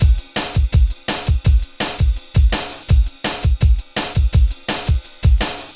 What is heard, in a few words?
Programmed Stepz, Jungle Drum Loops; Zero-G Jungle Warfare 1, 2 & 3; 90 s Sample CDs